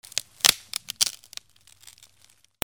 BREAKING

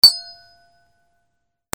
BANGS

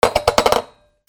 KITCHEN

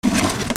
OFFICE

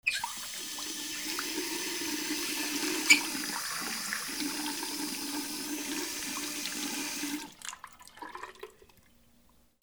BATHROOM

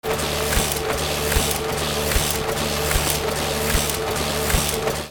INDUSTRY

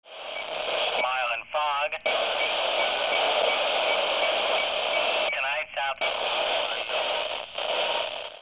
BOATS

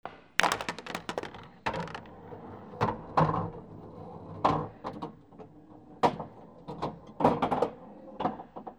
BILLIARD

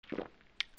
CARTOON

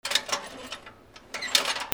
HOUSE

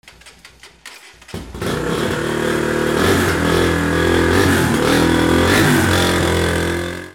VEHICLES

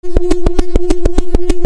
SCIFI

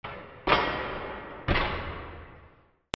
DOORS

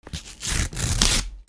RIPPING

